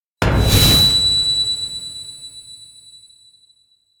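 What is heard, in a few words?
electronic high tone; anemoi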